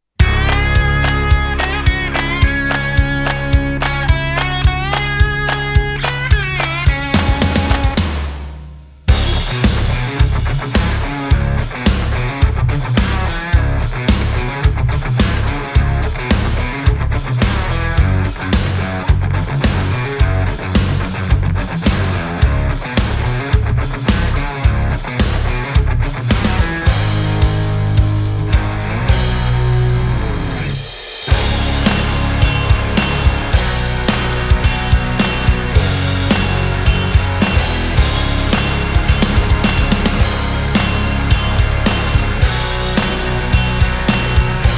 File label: Get Ready For Game Day No Vocals